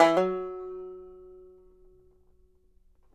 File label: BANJO NOTES, GB3; Sampleheads; Whole Lotta Country 1 & 2